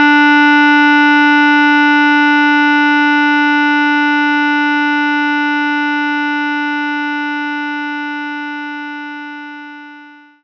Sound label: clarinet D4; knights try